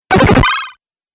8 Bit Aggresive Laser Bleep

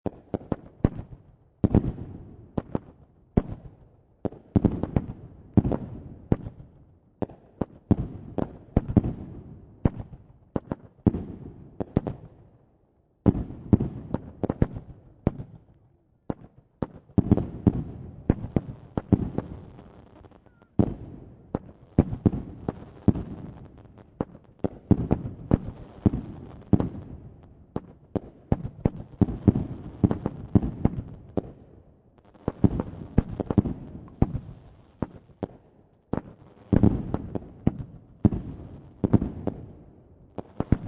Fireworks Display Distant; Fireworks (Sound Effects:Backgrounds)

